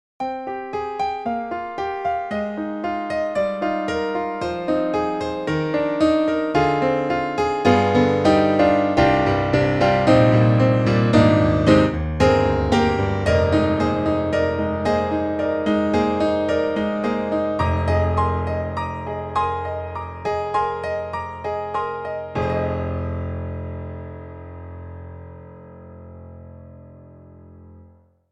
Roland INTEGRA-7 Bright Piano (SuperNATURAL Acoustic Tone)